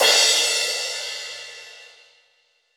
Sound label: Crash, Cymbals; Roland BK-5